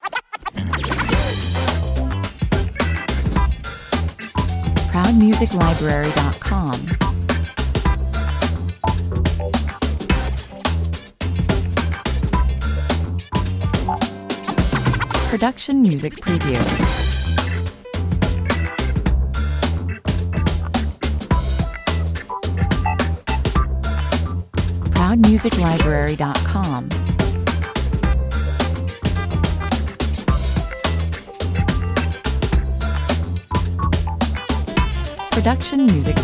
funky jingle 107 bpm, A TV/Radio jingle. Energetic, positive fun and quirky.